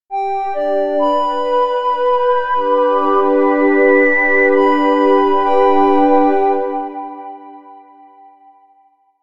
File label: Moon Innocence Logo